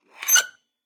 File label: whoosh source metal squeak; sonniss gdc